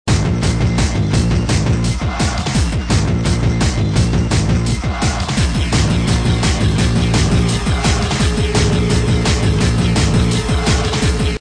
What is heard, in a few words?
radio